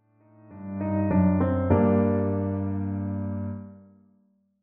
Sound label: menuettino